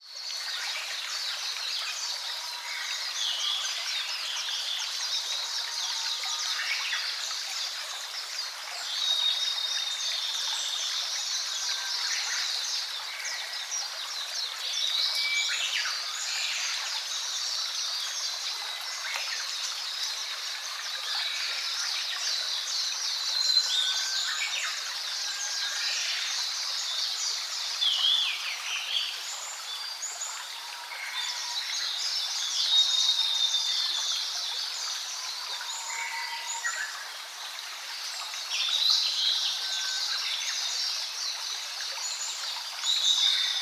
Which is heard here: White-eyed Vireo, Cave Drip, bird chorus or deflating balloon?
bird chorus